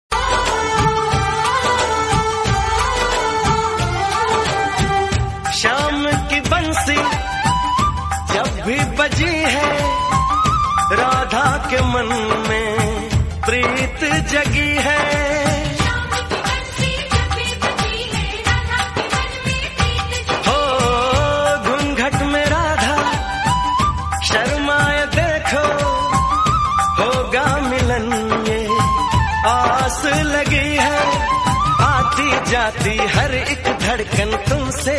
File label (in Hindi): Bansi; Shyam